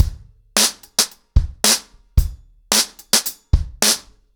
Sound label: 110BPM, ACOUSTIC DRUMS; The Roots Drumloops